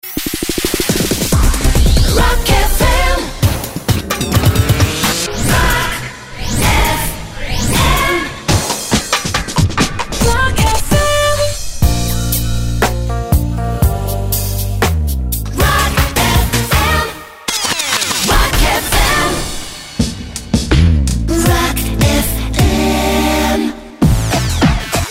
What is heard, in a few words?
Web Jingles; Roc FM; The Kit